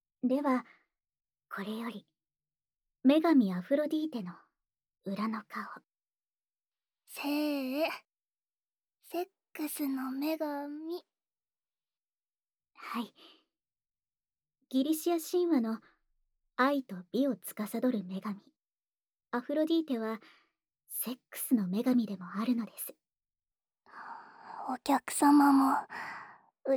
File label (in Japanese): 『Ｗ耳舐め＆手コキ』（VOICEver）, ASMR